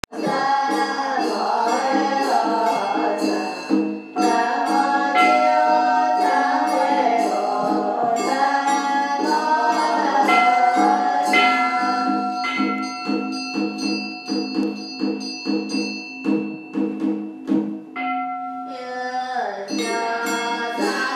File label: Foshou chanting